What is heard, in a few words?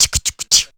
Vox Train; Zero-G Jungle Warfare 1, 2 & 3